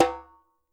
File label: JEM LO PS, Percussion; Sampleheads; NYC Percussionworks; ST PERC VOL1